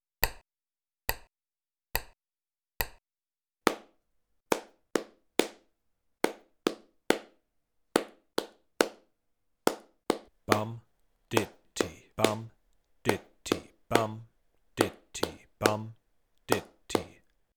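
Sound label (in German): bum ditty zaehlen und klatschen, banjo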